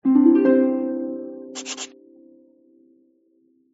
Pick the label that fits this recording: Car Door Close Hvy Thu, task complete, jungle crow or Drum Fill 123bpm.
task complete